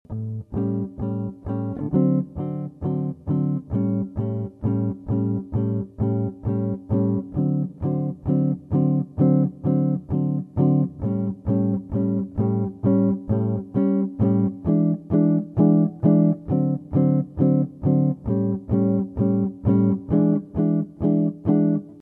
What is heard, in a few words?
blues